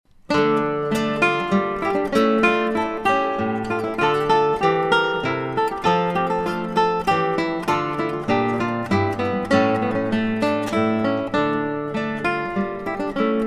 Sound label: horn